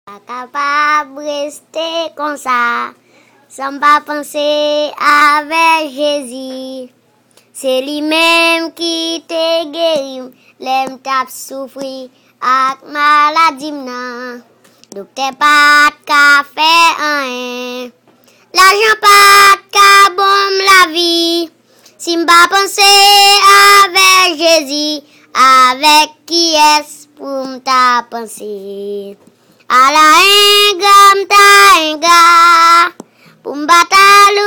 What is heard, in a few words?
singing